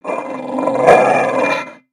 Pet Dog Robotic Angry; creatures; pets; Lego

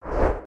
Wing; creatures organic

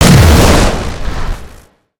Blaster Blast; Overcharged

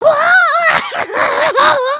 panic loop; emotes

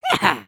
Spawn; Lego Strombie; creatures organic

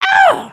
drink

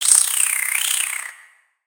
Spider Idle Look; creatures mechanical; Lego

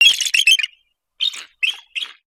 Purr

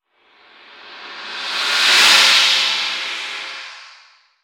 Car One shot Pitch Up

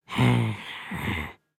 Idle Bobbing; creatures organic; Lego; Strombie

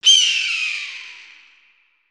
Hawk; gnarled forest

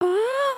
camera picture; emotes

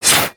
Swing; Little Friend

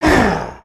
Troll Groan

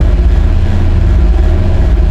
Launch Pad Drone; gnarled forest

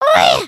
excited, emotes

